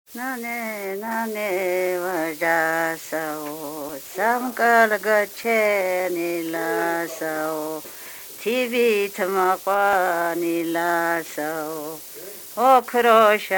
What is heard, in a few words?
Nana, Agmosavletii; Khevi